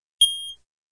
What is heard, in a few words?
Beeps; 헐리웃특수효과음; 신호-경적